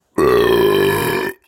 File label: Loud Belch